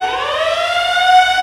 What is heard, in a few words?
Siren